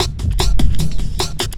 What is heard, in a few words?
LOOP, Drum & Bass, 150 BPM; Ueberschall; Drum & Bass Resonance